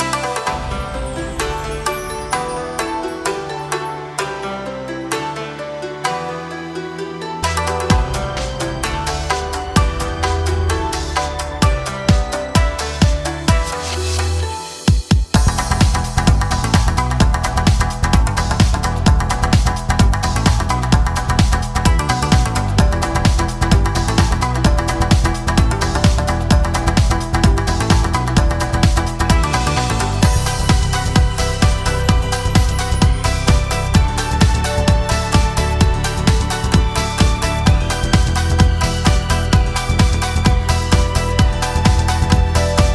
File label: JAZZ